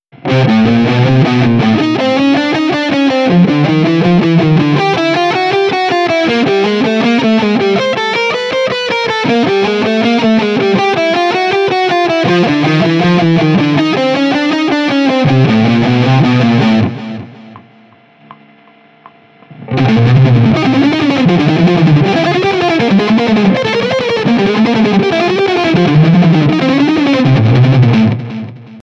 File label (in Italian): crom contrario salto 3 corde; crom contrario salto 2 corde